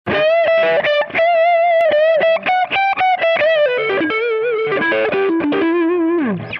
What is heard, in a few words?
Les Paul Overdrive